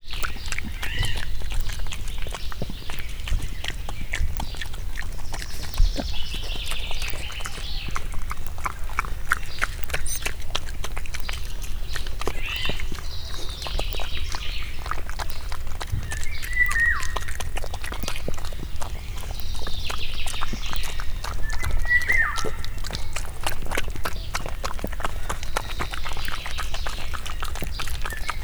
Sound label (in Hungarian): csámcsog rigó, ormányos medve; miskolci zoo 2009